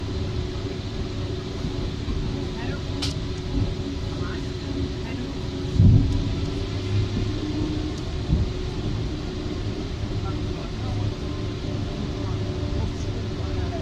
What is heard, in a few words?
Metro slow